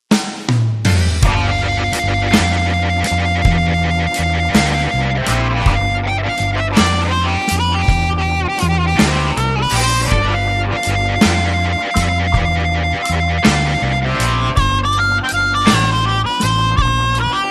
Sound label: Slow Harmonica Blues